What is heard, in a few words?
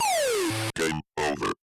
game over ringtone